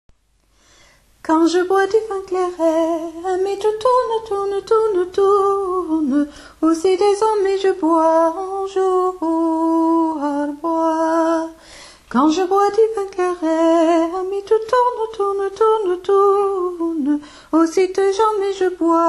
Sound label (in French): soprane; tourdion